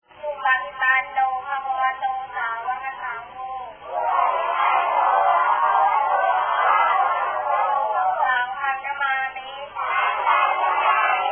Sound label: Na Lao children praying